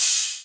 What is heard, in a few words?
Ride EDM Toned; Drums